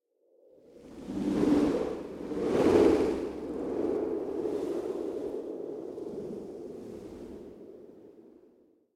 Wind Gust Short Passing Whistling Wind; Hollywood Edge; sound designer's toolkit v2

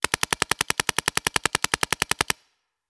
Paintball Gun; Sound Ideas; Guns

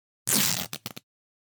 Fabric Tear Rip - Foley Cloth Movement; Hollywood Edge; sound designer's toolkit v2

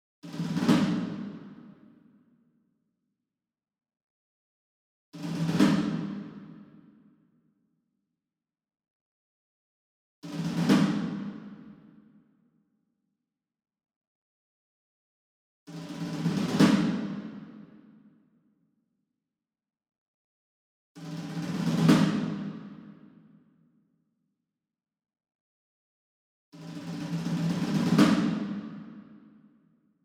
SINGLE DRUM Shime Daiko Roll Far; boom library; Cinematic Strikes Construction Kit

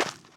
dirt; firefight

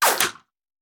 Bullet Impact; Sound Ideas; Guns

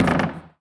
Street Ball Glass Back

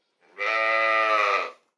animals sheep; Blind Drive

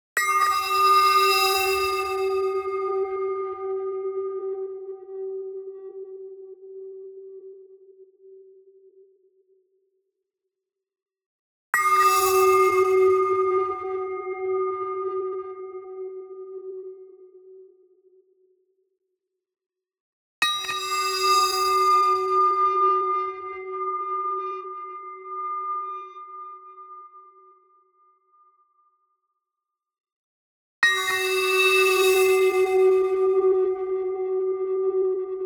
NEON PING; Fluttering Neutrons; boom library

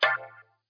dice click; Dice World

